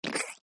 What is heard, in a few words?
yucky slime; Barotrauma; Barotraumatic; Seasnek